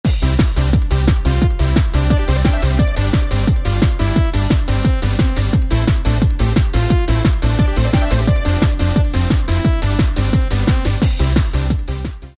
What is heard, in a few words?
Happy Hardcore, Roland D2